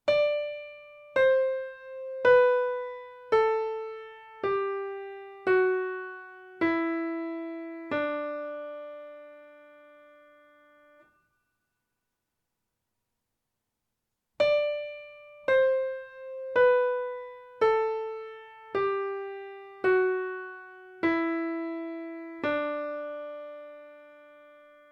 Tonleitern hoeren

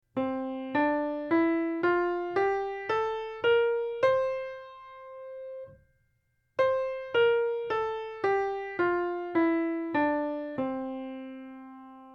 Mixolydisch

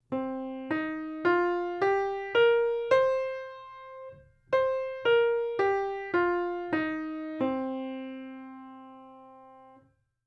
Moll Pentatonik

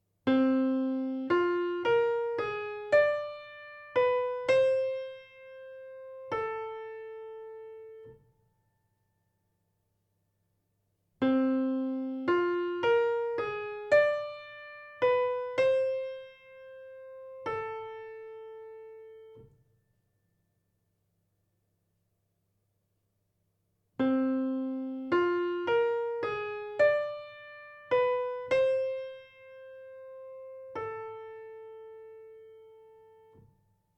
atonal

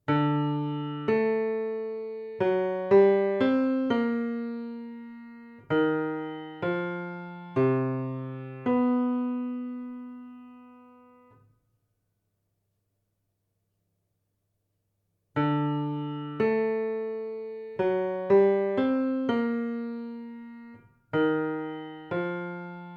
atonal

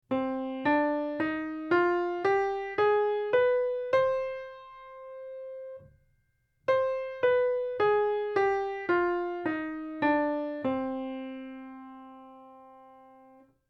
Harmonisch Moll

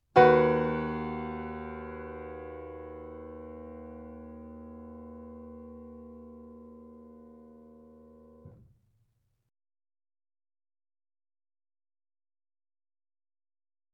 Dreiklangsstellungen

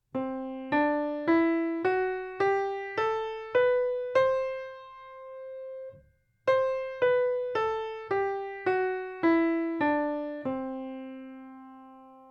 Lydisch

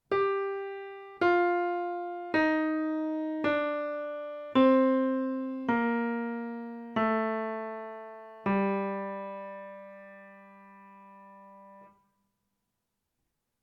Tonleitern hoeren

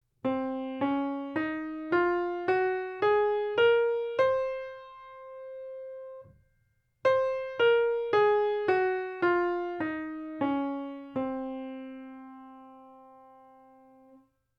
Lokrisch

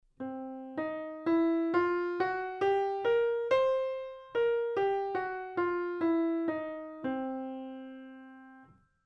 Bluestonleiter

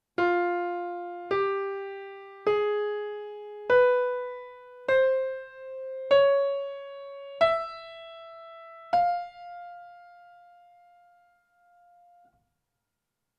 Tonleitern hoeren

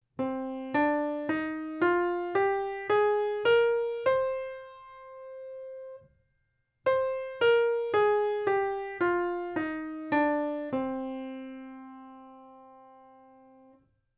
Moll Aeolisch

